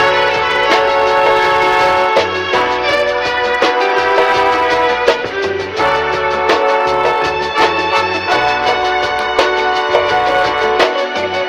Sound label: strings disco; Loops; Phonky